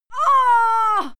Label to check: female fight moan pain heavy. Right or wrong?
Right